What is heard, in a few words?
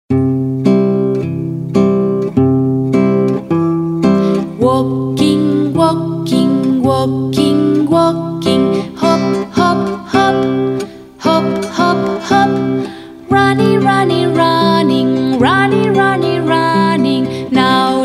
Walking walking